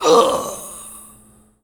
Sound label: dwarf die; wesnoth